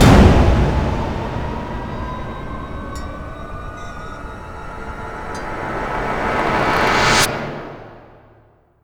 Death

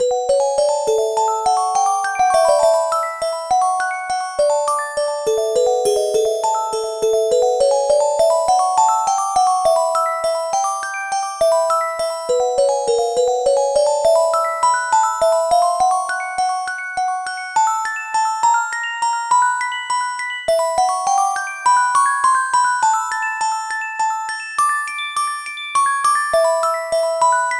Underwater (Fast); New Super Mario Bros. 2; Gold Ring layer

